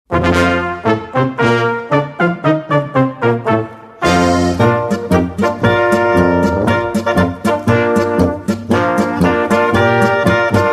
marsch

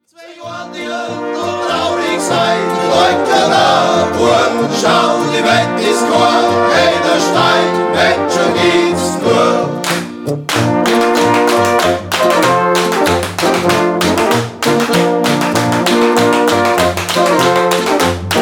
landler, tanzlmusi

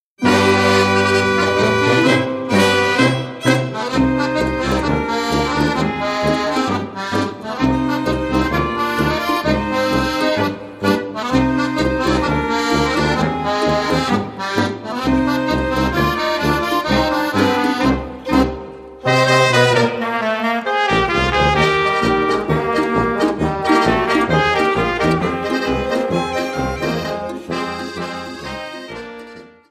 tango polka

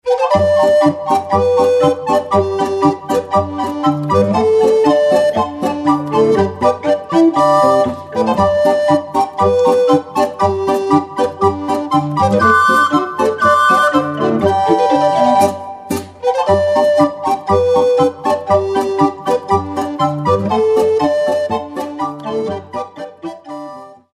plattenpolka

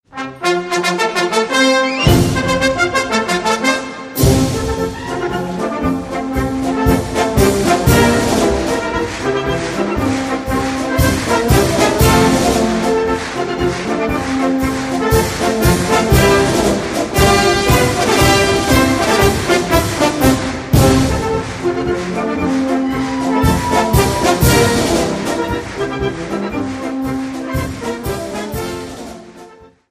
marsch

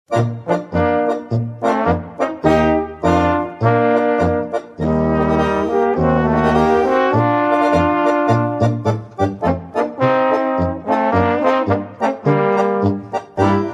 polka

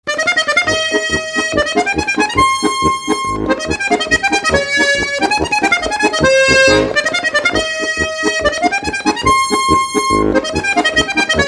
trompetenpolka

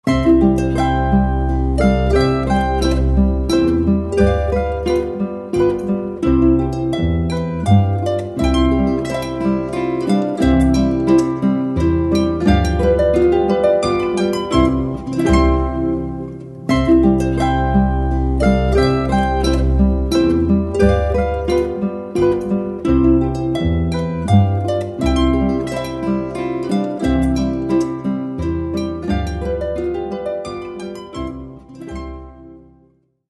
menuett; hirtenmenuett

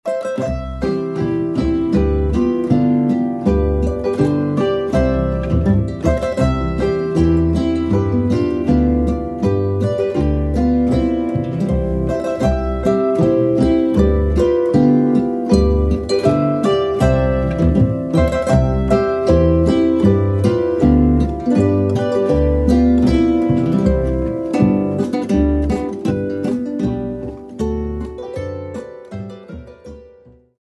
boarischer; hannerlboarischer

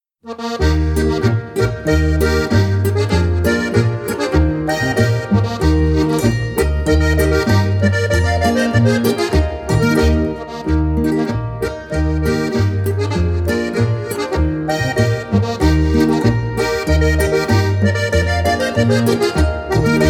boarischer

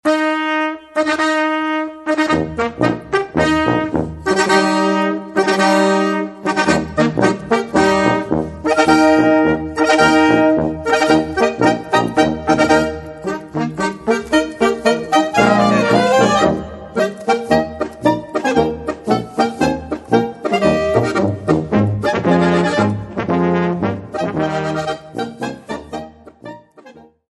marsch

